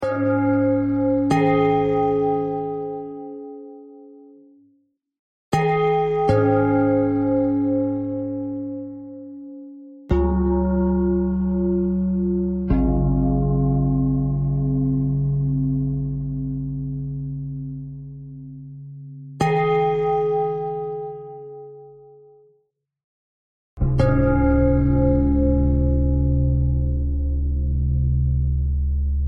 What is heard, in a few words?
bell thread pentatonic